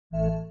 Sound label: Click; Windows 7 Logon Background Changer